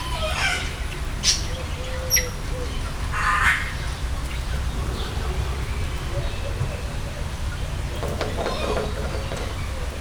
csőrét dörzsöléssel tisztogatja, trombitás szarvcsőrű; Győri Zoo 2014

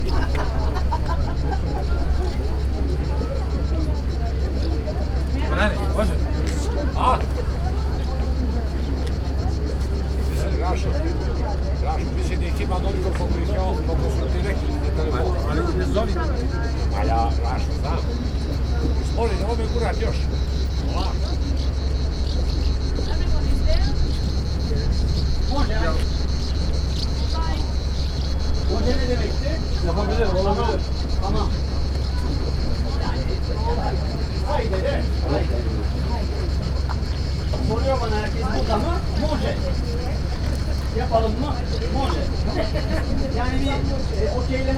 kirandulokkal indulo hajo kabocamadarak

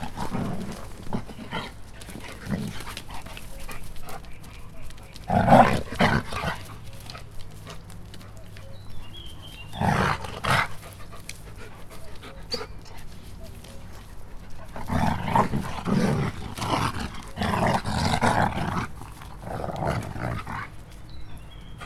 farkasok harca, szürke farkas; szegedi zoo 2011

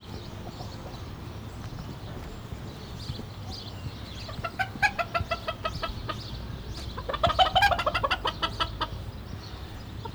két tyúk kotkodál; állathangok; nyíregyházi zoo 2010; paraszt udvar

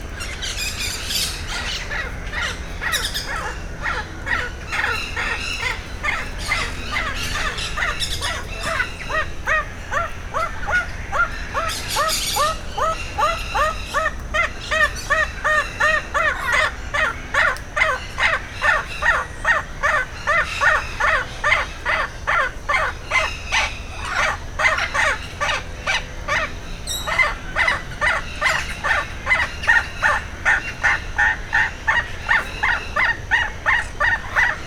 zöld papagáj féle; madarpark

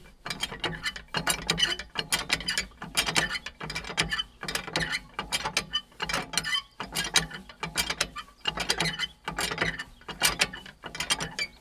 hosszú karos nincs víz; Varbóc; artézi kút

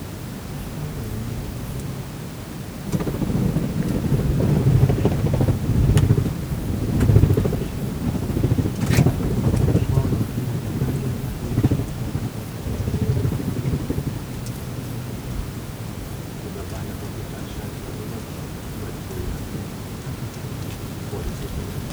egyszer halk emberi beszéd, denevérek hallható hangtartományban kommunikálnak; termeszetben

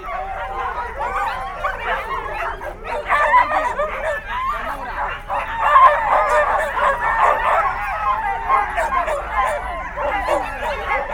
szánhúzók halk beszéd, kutya; állathangok; paraszt udvar

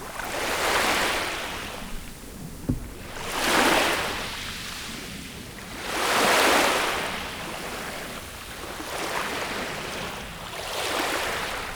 harom meterre a viztol, felemelt mikrofon, tenger; thassos 2014

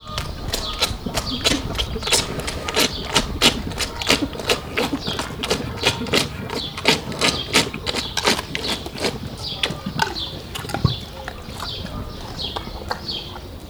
szürcsölve iszik, orvosmedve; debreceni zoo 2012; marantz puska

